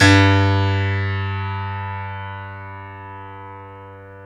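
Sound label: piano, Grand piano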